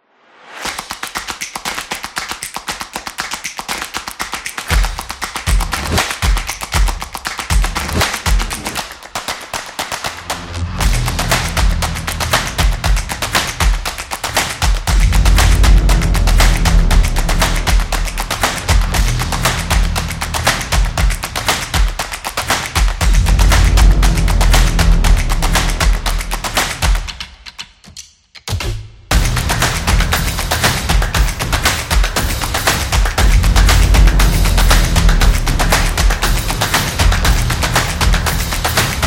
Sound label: Sport Percussion